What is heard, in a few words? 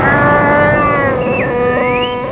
chewy R2D2